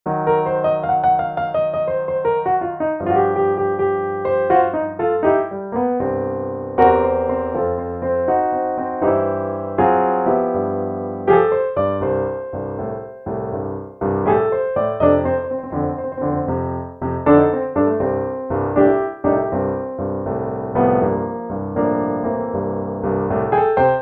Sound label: Blues Upright Bright